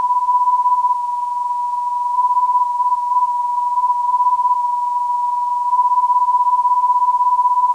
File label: 402kHz, ndb